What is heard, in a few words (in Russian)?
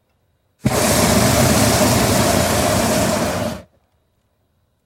zvuk podzhiganija gaza i vybrasyvanija plameni